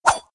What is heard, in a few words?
sword slash